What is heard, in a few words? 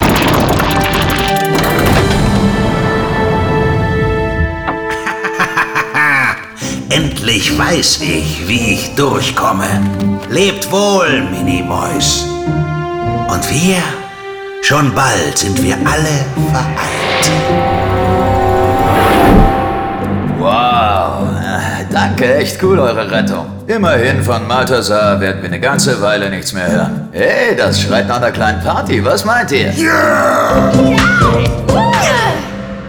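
Outro Victory German; arthur and the revenge of maltazard; wii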